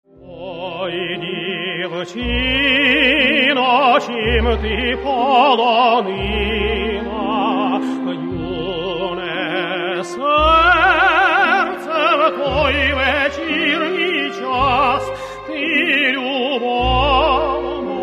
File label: romantic songs